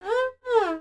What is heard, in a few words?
Pirate Adjust Hat Tip; foley